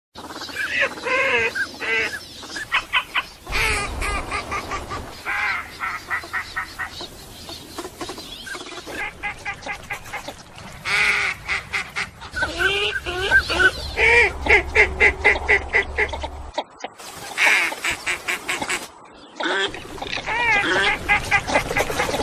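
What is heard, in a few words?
northern shoveler sound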